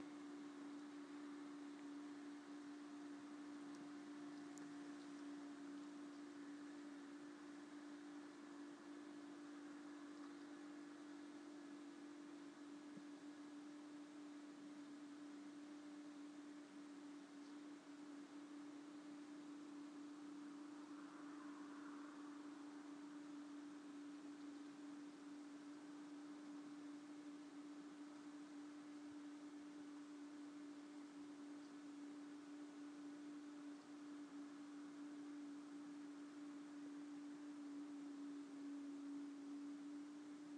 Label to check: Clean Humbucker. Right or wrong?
Wrong